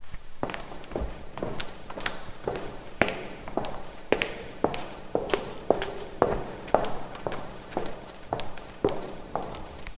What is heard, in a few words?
sounds of steps in a, cavern